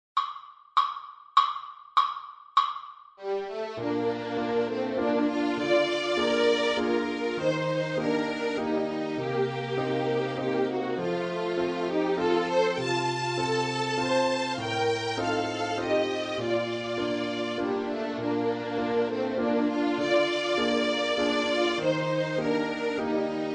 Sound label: waltz